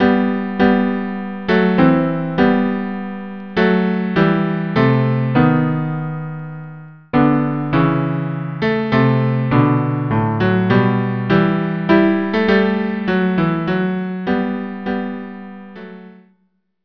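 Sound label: cello cello; Morning Prayer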